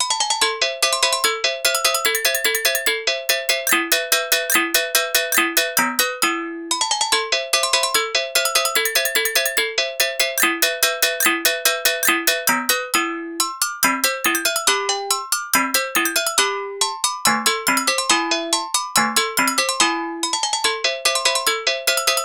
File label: agogo